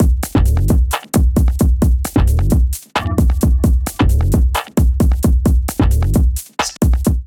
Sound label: Kit 132 bpm; MIDI Clips; Drums; Micro Tuna Kit; Ableton; Factory Packs; Skitter and Step